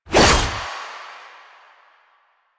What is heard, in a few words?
Naginata Whoosh; Weapons; Melee; Warframe; Koumei Naginata